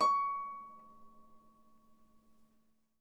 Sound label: Harp C#6; Miroslav Vitous - Percussion & Harp